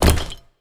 empire game settlement ui place set down cloth rattle